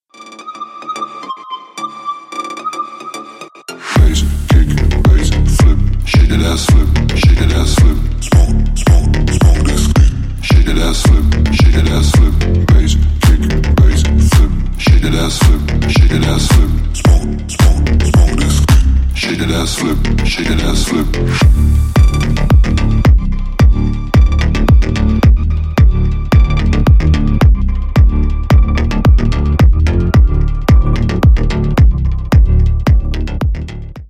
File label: bass flip